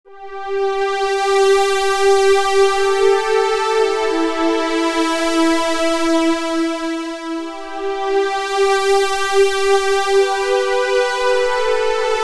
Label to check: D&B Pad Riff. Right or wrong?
Right